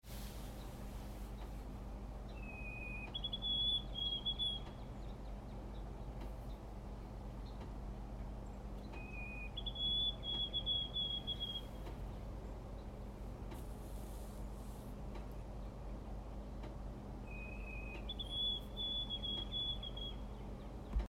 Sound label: whitethroat